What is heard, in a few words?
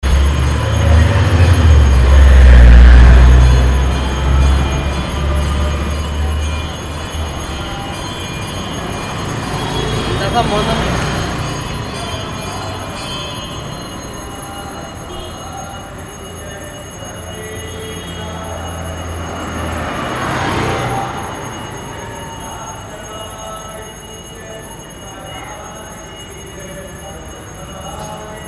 ritual transubstanciation street, South India street recordings